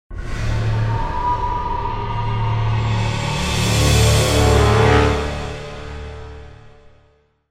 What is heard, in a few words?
Temple Fight Intro; The Da Vinci Code; XBOX